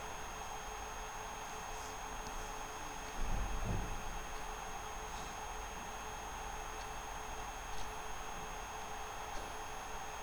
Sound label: Noise